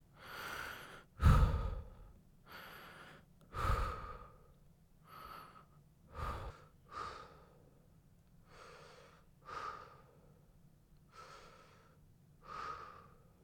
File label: Breathing